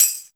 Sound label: TAMBRIN, TAMBOURIN; Big Beat Samples & More; AKAI